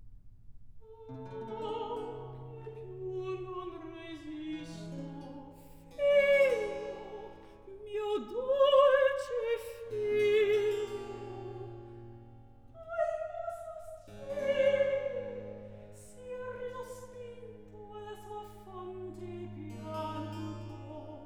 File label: Recit; Act II; Ah! che più non resisto; Handel; Ottone, re di Germania; Ottone, HWV 15